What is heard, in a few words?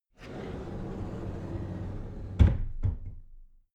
cupboard slide door close soft